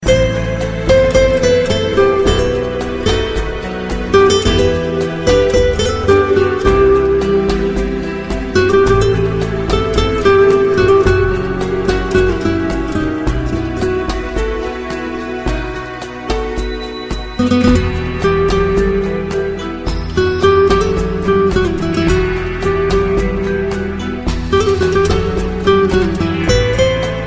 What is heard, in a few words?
Strings